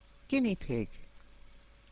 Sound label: guinea pig